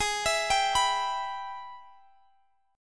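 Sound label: Ab Minor Major 7th Sharp 5th chord, Guitar, Standard tuning; arpeggiated; x,x,18,21,20,19; JGuitar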